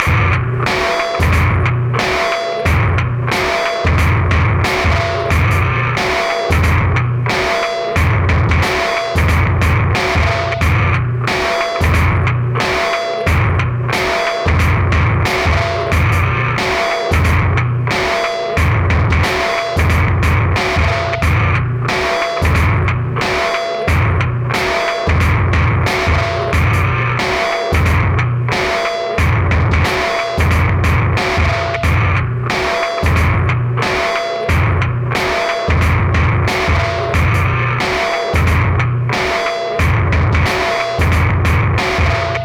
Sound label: LOFI LOOP 90.4 bpm; Planet of the Breaks